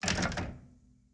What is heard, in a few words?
Lamp Start Move; Game rip; MiSide